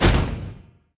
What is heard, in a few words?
boss ranged attack; bittering rancor